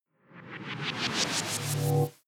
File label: sci-fi boot incoming; swooshes-swishes